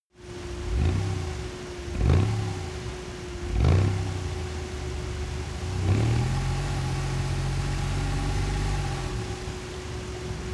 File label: sports car ext engine gas soft